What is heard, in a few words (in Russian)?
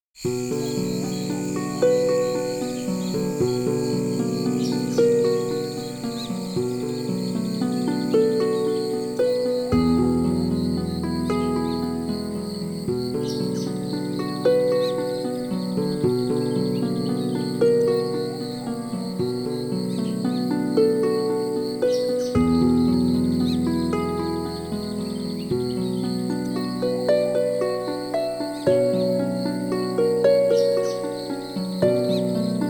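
звуки природы, ночной лес; Nochnoq les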